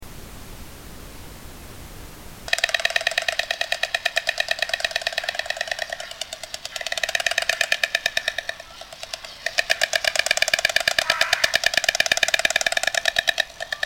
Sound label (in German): storch